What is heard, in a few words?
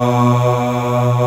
voice